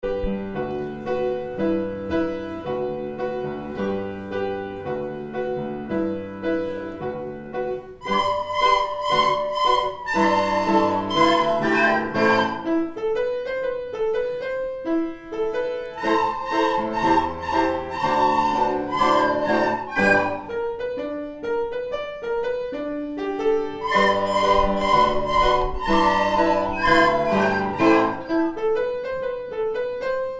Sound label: Recorders descant treble